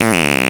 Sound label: Fart; Nicktoons Nitro; Arcade